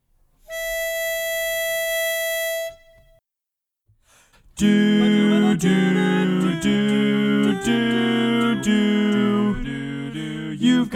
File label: Bari mono in E